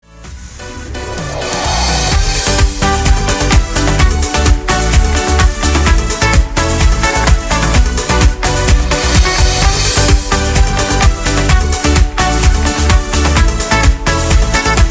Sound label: KARAOKE INSTRUMENTAL